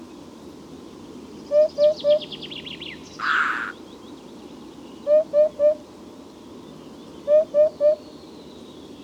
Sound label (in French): huppe fasciée chant cri